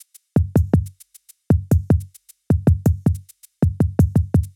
105 Loose Grooves, Percussion; Loops; Percussion (Stereo); Touch Loops; ElementsOne; Abstract Rhythms

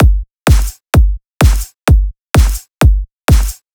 drum loop 128 Strp; Anthemic

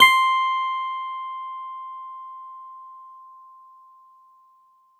Clavi, Digital Keys; Nucleus Soundlab; MK Digital Keys; MKClavi S

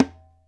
BONGO

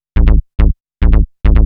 TECHNO BASS 140 A

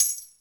TAMBURIN; drum; PERCUSSION

